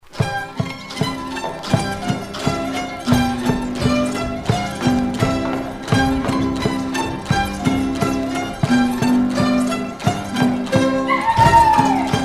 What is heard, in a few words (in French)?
polka piquee